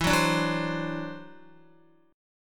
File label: E 7th Suspended 2nd Sharp 5th E down Guitar 4F 4A 5C 5G 5C 6E; strummed; chord; JGuitar